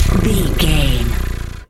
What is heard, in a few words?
cat purr